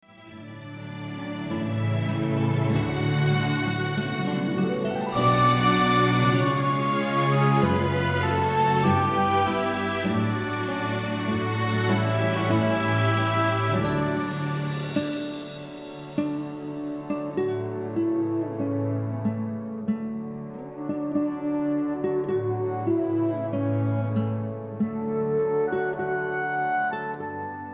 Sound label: wedding march, guitar